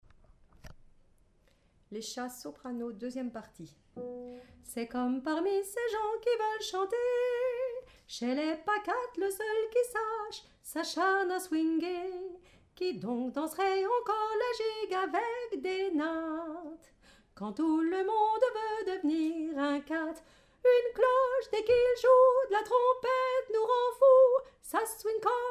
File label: Soprano